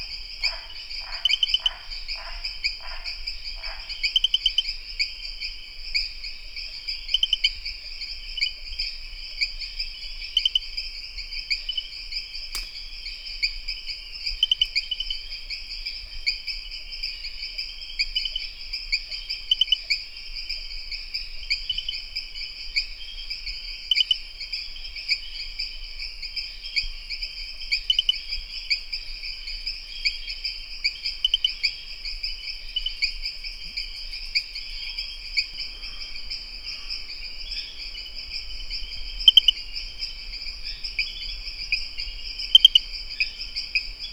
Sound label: Pristimantis espedeus